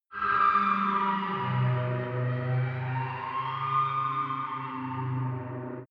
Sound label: far shuttle collide; drones